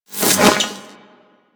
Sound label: Projectile Whizz By; Warframe; Sentients; Brachiolyst; Abilities